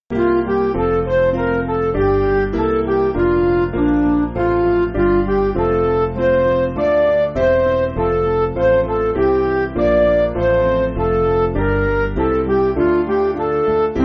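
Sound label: Midi, Music Backing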